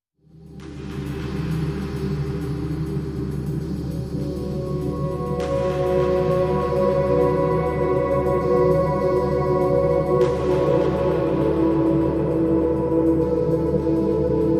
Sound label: Percussive